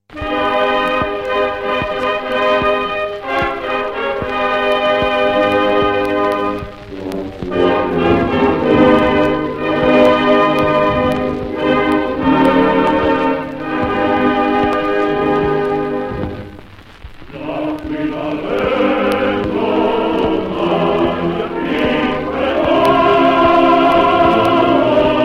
choir; Aquile Legionarie; military music in Italy; FASCISM

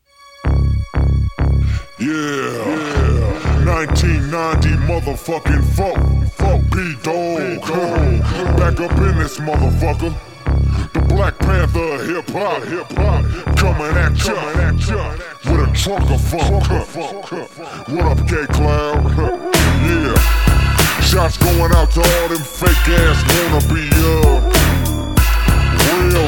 funk prelude; paris

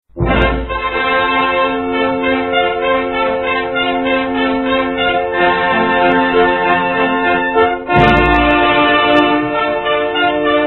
brass; Inno del Sovrano Militare Ordine di Malta - Inno del Corpo Militare S.M.O.M.; MILITARY MUSIC IN ITALY; WWI

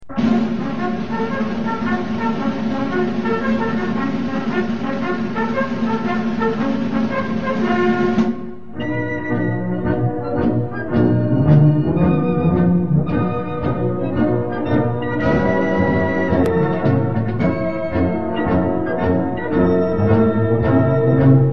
brass, military music in Italy; Giovinezza - Inno Trionfale del Partito Nazionale Fascista; FASCISM

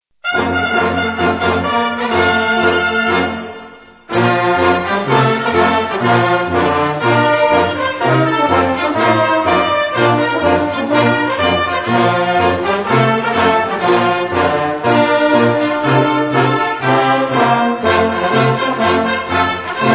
brass, military music in Italy; Inno dell'Artigliere; FASCISM; ETHIOPIA

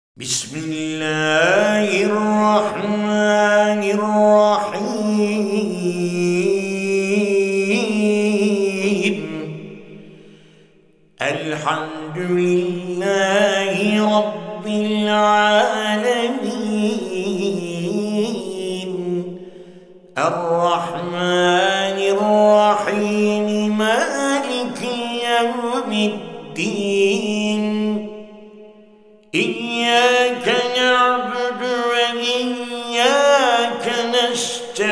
qur'ân recitation, istanbul, turkey, fatiha

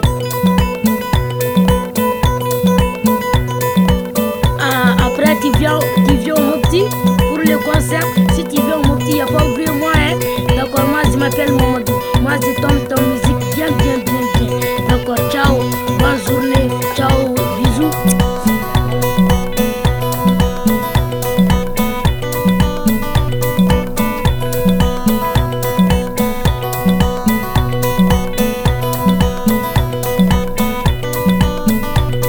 balafon, African Music

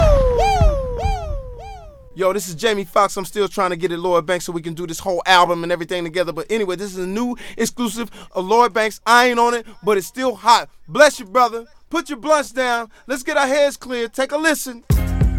interlude; RAP

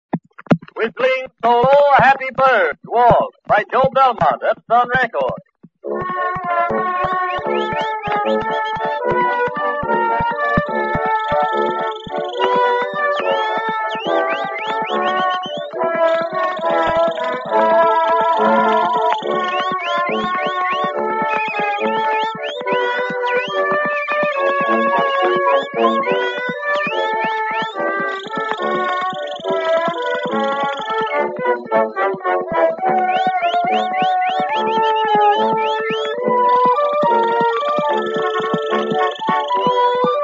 Happy birds waltz, Edison Cylinder; 1906

